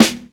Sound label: snare